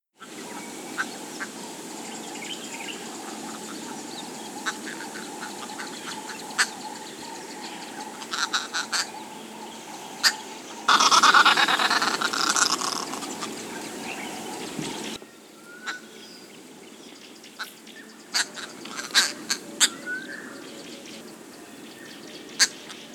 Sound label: Green Woodhoopo; Phoeniculus purpureus